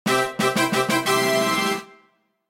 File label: rankup fan; line bubble